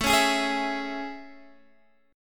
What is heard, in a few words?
A Major 7th A, Guitar, Open D